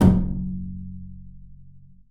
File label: BING F#1, BASS FINGER; Xsample VOL-5